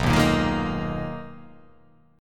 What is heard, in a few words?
B Major 13th B down